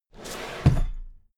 drawer cabinet equipment close heavy halfway

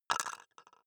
plastic cup drop wobble

